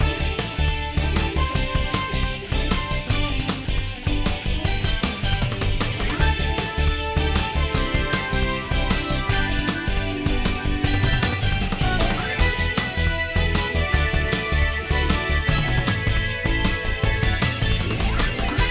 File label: Bright and happy